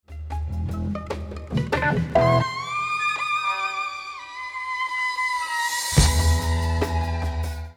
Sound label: Violin (fake)